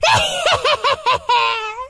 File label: laugh; veigar